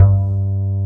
W BASS G2

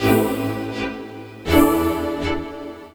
Strings Pump; Sonic Foundry (Sony Creative Software); Knutrix Drum & Bass

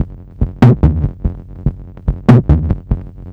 Percussive; Sonic Foundry (Sony Creative Software); Machine Language

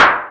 NOIS, Techno Trance; E-MU Formula 4000 Series Vol. 2

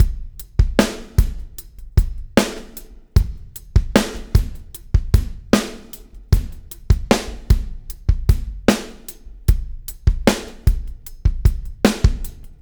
REV, REVEREND; Spectrasonics; Backbeat

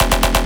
Massive Comlextro, bass

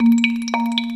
DSP; OMI - Universe of Sounds

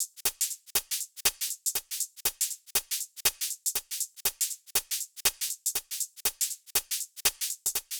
Hh Sh Loop 120; Equipped Music; Loungin House

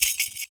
FOOT PERC; Univers Sons - Raricussions

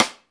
STICK, Drum 1 Dry; East Collexion

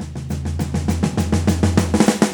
Fills 203; Zero-G; Monster Beats

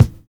BD, Drums Dance & Groove; USB Soundscan vol.11; AKAI; NYORK KIT

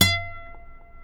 F#5 nylon, Guitars

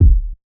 Sub Kick; Zero-G; Nu Disco

